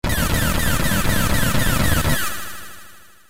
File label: canon explosion; ff3; ff3us